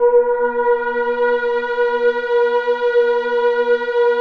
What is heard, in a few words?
Atmo Pads; Best Service; XX Large